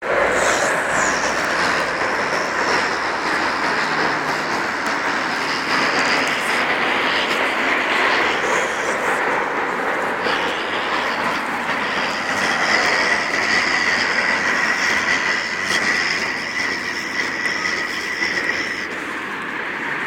drones while walking beside corrugated fence to generate flange effect